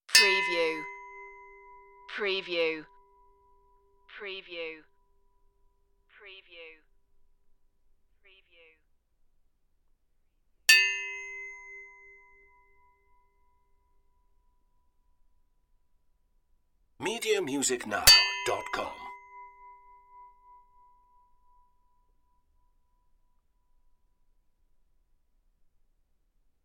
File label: metal clinks